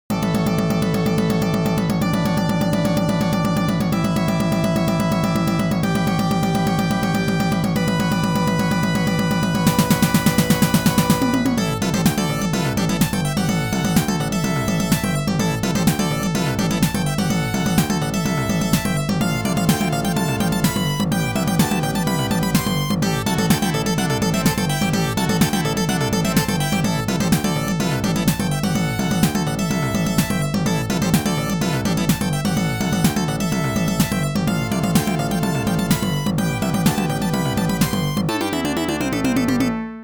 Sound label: BGM Waterfall Stage; Oretachi Game Center Zoku; Contra